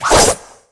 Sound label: katana, samurai